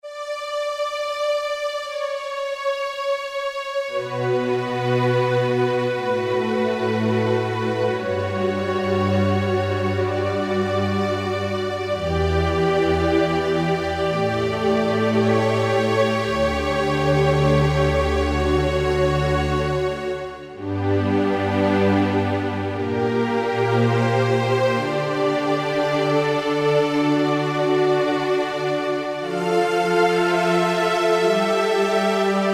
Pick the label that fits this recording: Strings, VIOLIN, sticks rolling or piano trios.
Strings